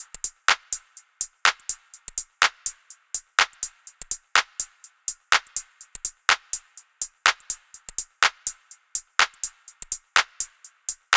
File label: TOP LOOP 124 BPM